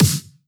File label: snare; osu